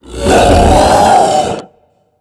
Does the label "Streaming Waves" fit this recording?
Yes